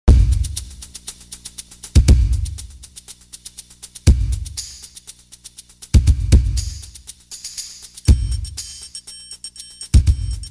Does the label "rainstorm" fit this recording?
No